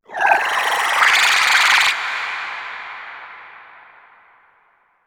Bahro Scream; mono; moul

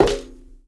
Maint On Stone Walk; moul

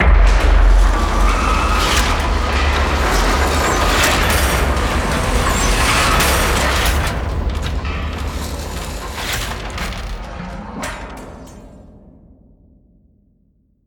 Power Tower Up Down

